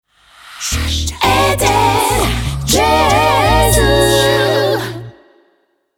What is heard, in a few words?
short wow